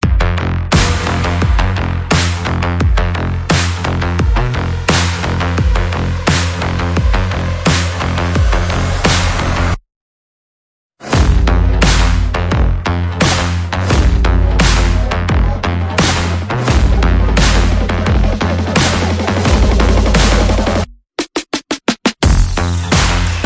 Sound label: Percussion Bass and Effects